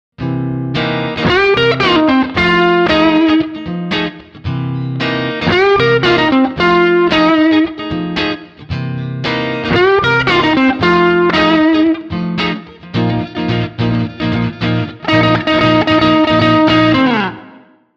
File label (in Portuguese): Pentatonica